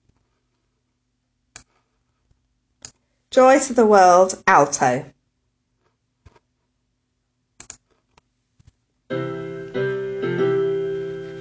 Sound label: Joy To The World Alto